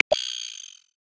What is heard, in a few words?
Red-Billed Tropicbird, Galapagos; Xiaomi Redmi-8A